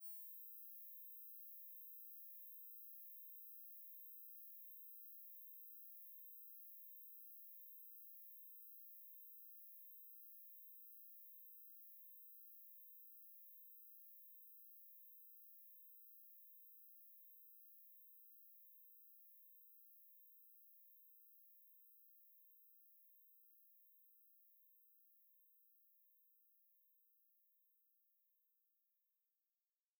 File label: salicylic acid fade 96k